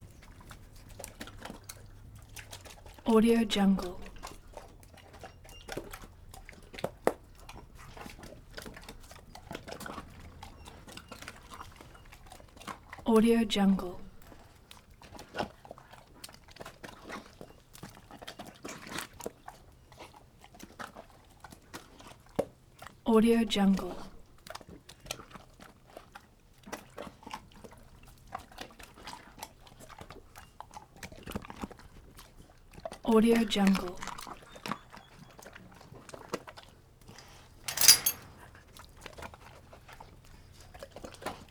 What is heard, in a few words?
wolves eating 2 or 3 drinking crunches wet